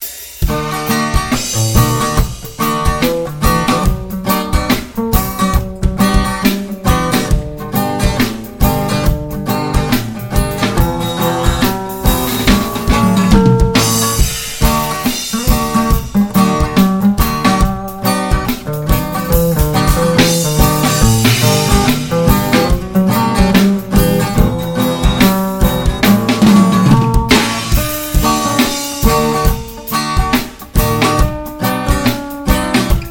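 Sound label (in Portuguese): bateria reggae; tecio batera; reggae 3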